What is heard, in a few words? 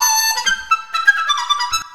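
CH FLUTE, Chinese flute; Soundscan vol.60; Asian Traditions; AKAI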